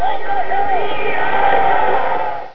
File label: ambush; Ocean Bound v1.0